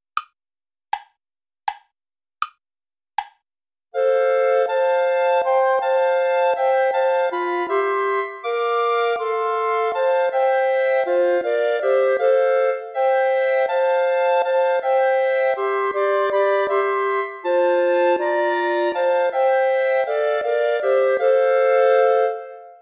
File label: play along without bass clarinet